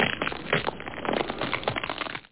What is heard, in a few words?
CRAK